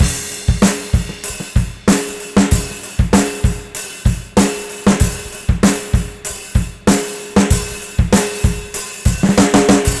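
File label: 96 bpm, beat loops; Zero-G; Monster Beats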